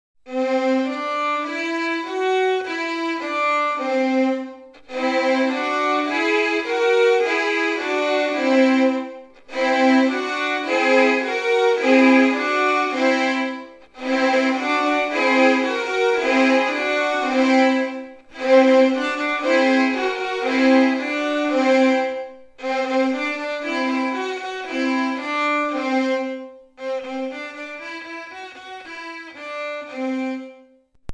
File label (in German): mit geige; naminmin; 音痴な蛙の歌（笑）